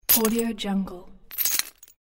chain dropped on the ground

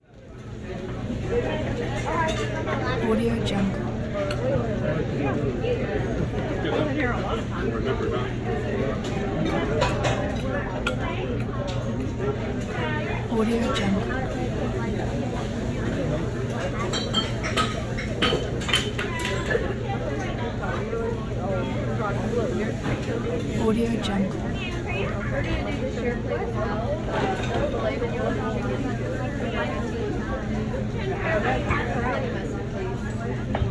restaurant ambience

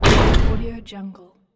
slam the door

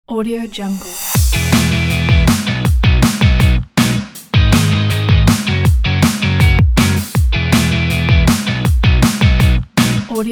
upbeat happy sammer rock

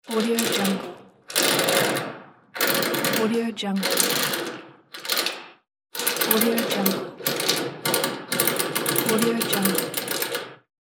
chain

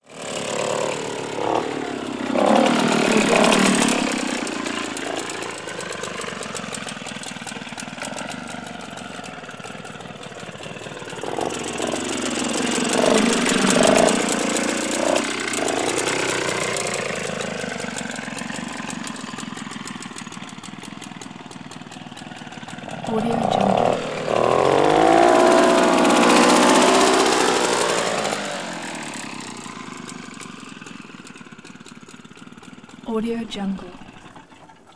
scooter moped 2 stroke

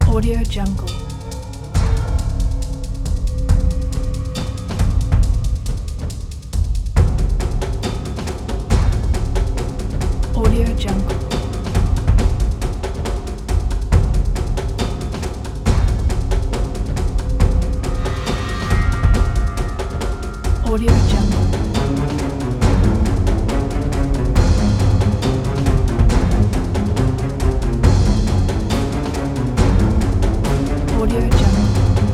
epic cinematic action trailer

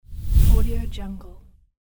whoosh transition

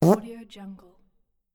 fart

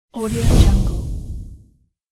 blow whoosh; fxprosound

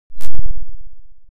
cinematic hit fizzing wooble

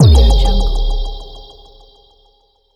hi tech impact

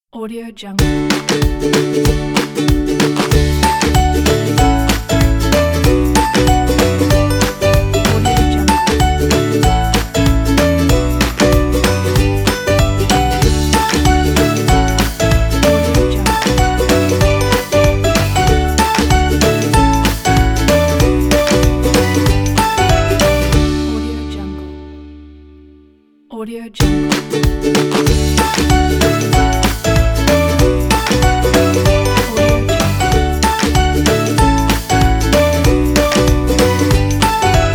upbeat happy fun logo

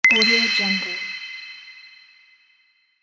buy item

